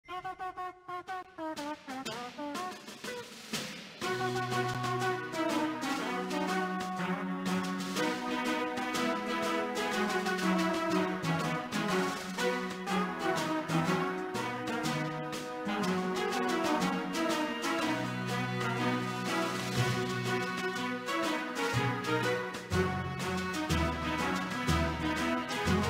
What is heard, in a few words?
Trumpets Of Inamorta music; Stick War Legacy; Android